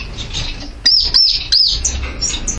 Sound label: is this a hen call youngster, lavender waxbill